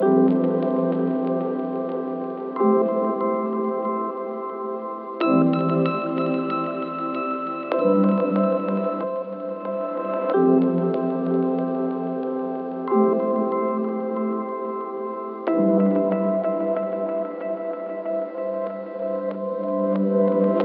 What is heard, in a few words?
Cute Loop Chords C Major 93bpm